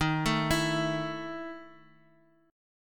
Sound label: E Major 7th Suspended 4th, D sharp